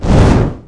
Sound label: torch; sports room sfx; birthday party bash; wii; Spanish